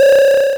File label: 8bit Beam